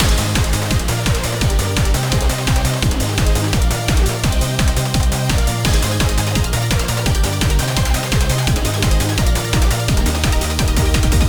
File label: Cute Beat